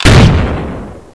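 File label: grenade launcher, weapons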